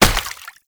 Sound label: Proto Infested Step Water; Enemies; Steps; Warframe; Brachiolyst